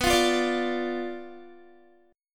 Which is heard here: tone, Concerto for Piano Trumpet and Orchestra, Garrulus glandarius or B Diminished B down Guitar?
B Diminished B down Guitar